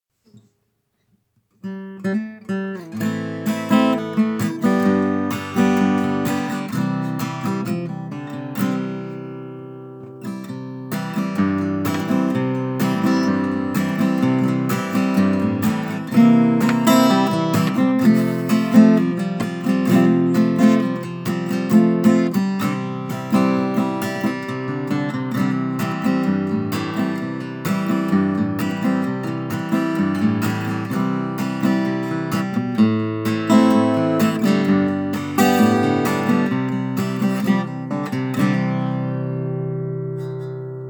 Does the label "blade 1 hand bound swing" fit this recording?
No